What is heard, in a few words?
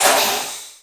VEHICLES EXTRAS DUMP VALVE